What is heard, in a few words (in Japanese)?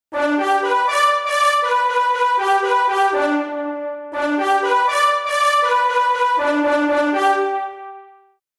競馬のファンファーレ